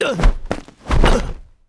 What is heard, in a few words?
Wizard M dead